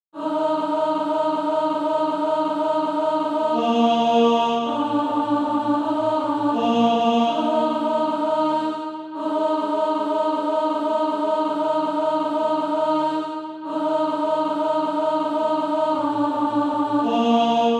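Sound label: alto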